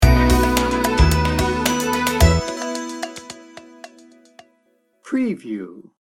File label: cool comfort sting bumper